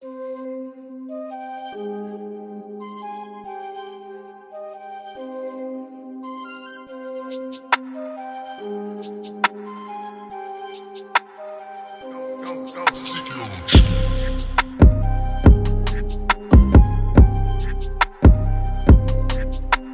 music tribal 140bpm c min; tzichi

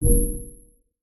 science fiction door code accepted beep warm

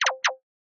message notification alert; the sound pack tree

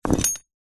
weight concrete metal handle set down hard ground

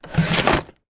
household cabinet drawer open; zapsplat

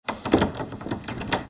door lock; fox audio

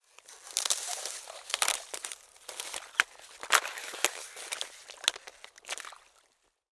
nature ice crack

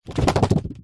office file folder wallet card shake wobble short

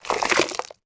water soapy pour

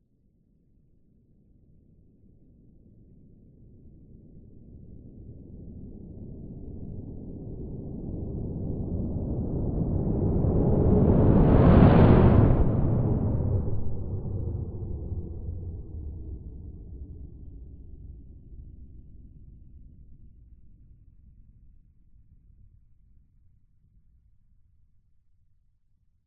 science fiction spaceship mothership pass by

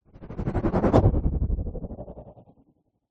sound design sweep chopper stutter dark

